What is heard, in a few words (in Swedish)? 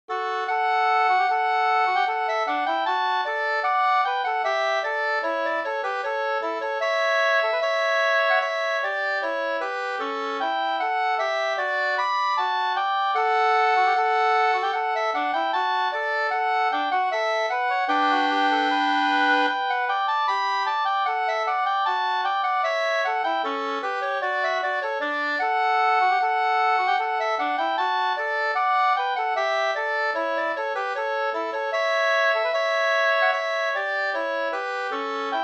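brudmarsch 2 stämmor; Brittas brudmarsch av Sven Forsberg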